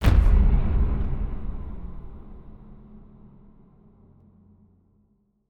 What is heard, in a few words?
Stingers sting monster